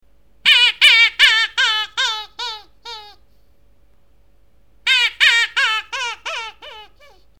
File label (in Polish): wabienie zdlawienie